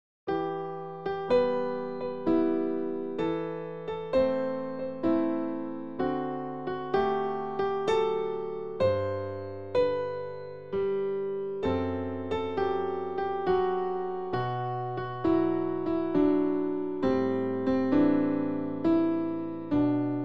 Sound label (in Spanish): escala